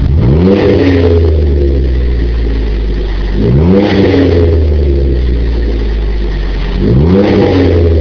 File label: jeep wrangler 3.8l single